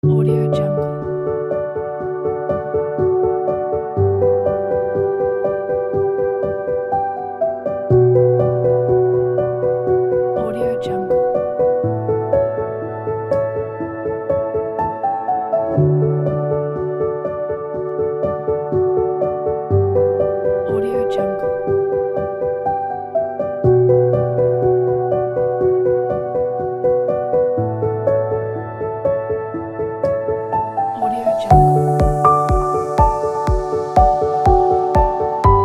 positive mood optimistic piano corporate

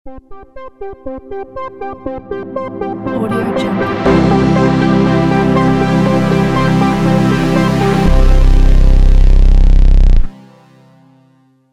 80s logo sound; ulyanastudio

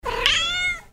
cat meow

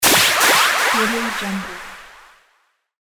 magic zap

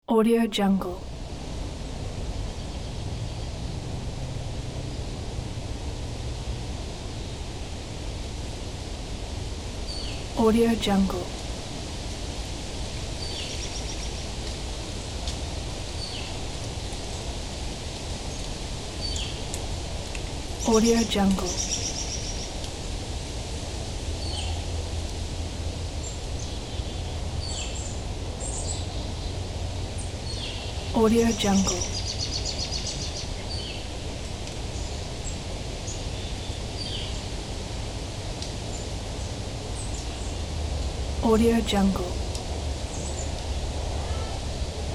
city park ambience

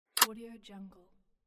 button click

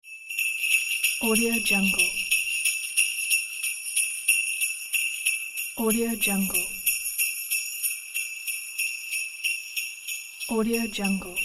christmas bells

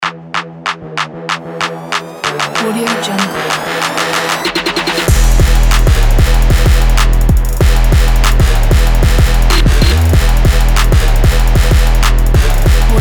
a trap sport

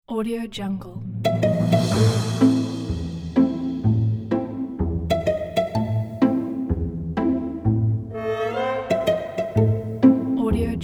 pizzicato comedy

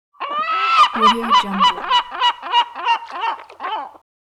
seagull

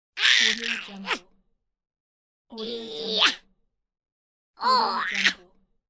cartoon voice effort

